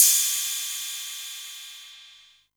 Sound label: 808 Cymbal